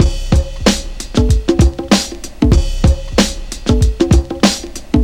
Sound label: funky monkey, 95bpm